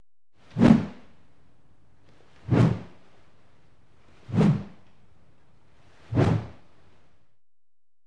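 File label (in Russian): звуки копья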